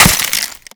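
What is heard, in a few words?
icicle break medium, explosions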